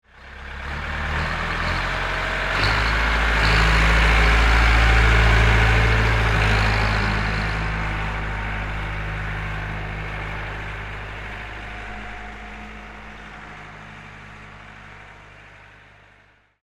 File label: Tow Tractor Pass By, Industry, Tow, Tractor, Pass, By, Diesel, Engine; Pettibone; BlastWave